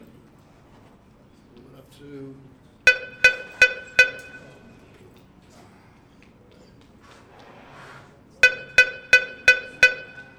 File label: Exercise 2 lower 172 tempo, brass practice